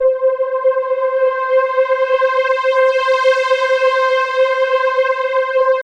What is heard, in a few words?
C, Drum & Bass Pads